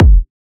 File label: Electronica Kick - G; House; Drum One Shots